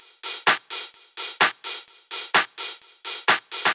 Loop, 128 BPM

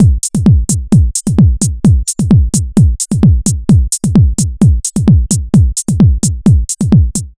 130 BPM, Digital Breaks; loops; Loopmasters; Jon Carter & Alex Blanco; Block Party Essentials

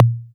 Toms

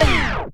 Drummers Stop; Fx; Loopmasters; Jon Carter & Alex Blanco; Block Party Essentials

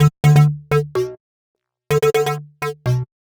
126 D B MUSIC; funky; Loopmasters; Funky Underground Vol 2

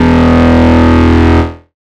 Bass Shot E

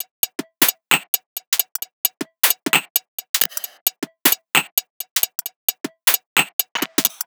Bpm132 Top Loop; Magma; Dubstep

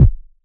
KICK, DRUM HITS; Dubstep; Loopmasters; The New Sound Of Dubstep

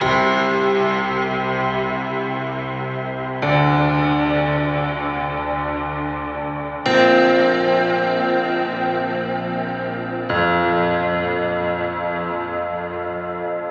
Bpm140 Whale Piano Loop CDA#F, Dubstep

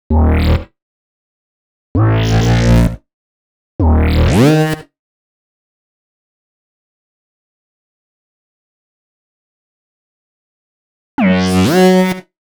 130 D#m bass, Complex Electro Synths; Prime Loops; Bias

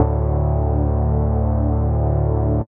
Bass Shot D

